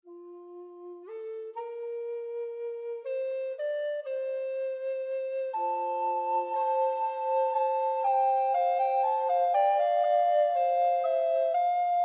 recorder palestina; emberton; Embertone; Recorder Library für Kontakt 5.3.1

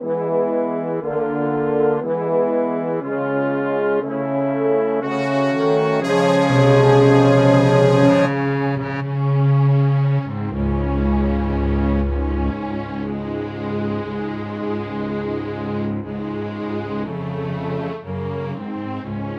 orchestra; uvi

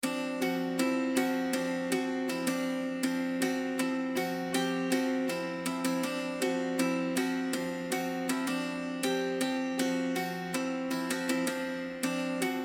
dulcimer; mountain dulcimer; Embertone

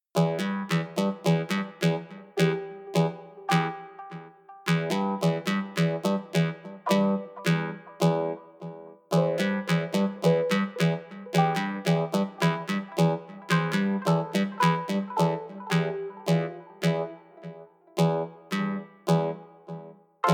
strum; aas

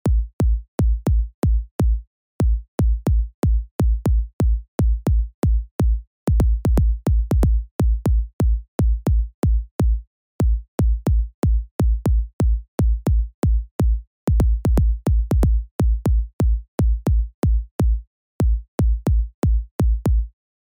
raw kick; rob papen; explorer 11

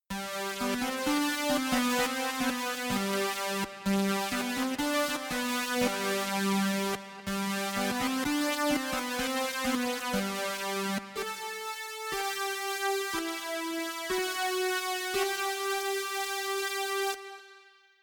mpowersynth test

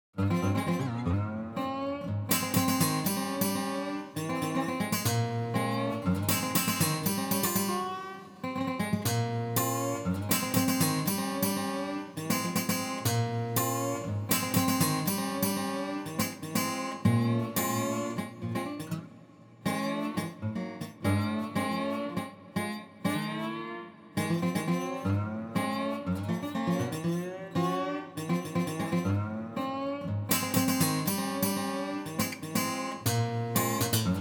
chamber; rev ocean; nautilus; arturia

